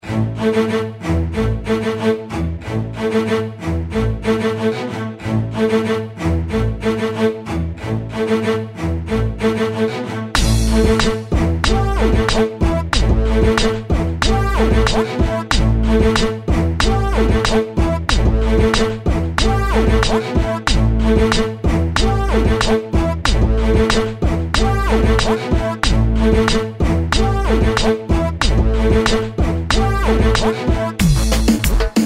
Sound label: reggaeton